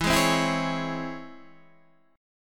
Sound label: Db Minor Major 7th E